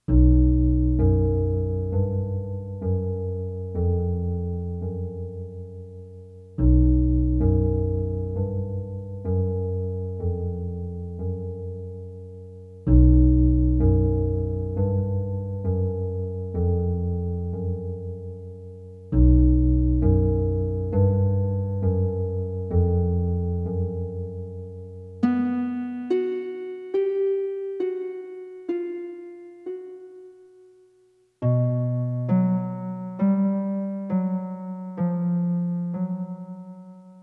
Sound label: dark woods underscore; brolefilmer